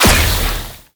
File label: imp split